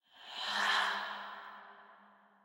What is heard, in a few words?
mirror Breath; fairymirror